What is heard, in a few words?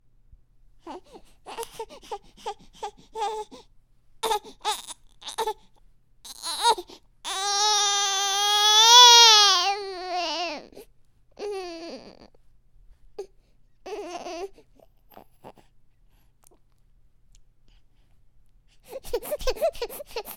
baby dissapointed cry